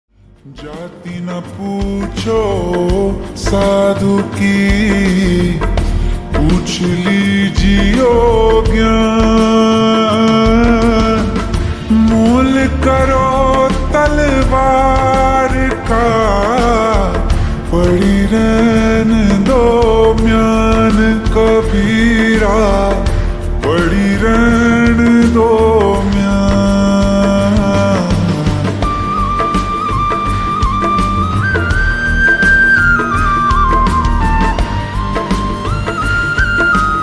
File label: Slowed Reverb Ringtone